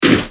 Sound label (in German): schuss verfremdet